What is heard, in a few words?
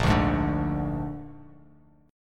Bb 7th Bb down, chord; strummed; JGuitar